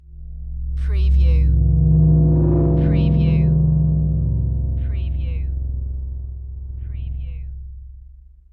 Space Scifi Sound - space scare

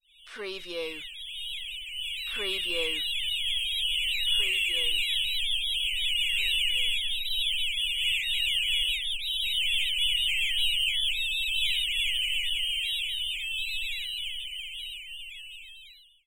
Space birds

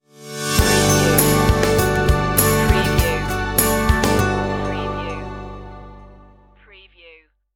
stinger

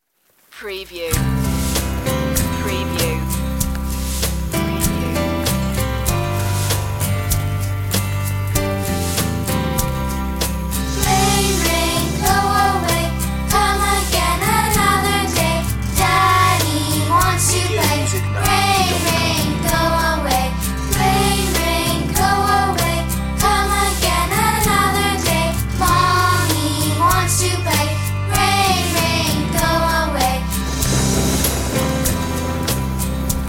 rain rain children group vocal

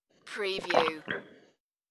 Swallow

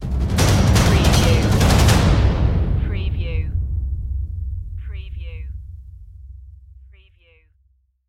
jungle ritual stinger

